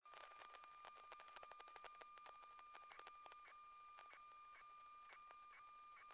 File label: signal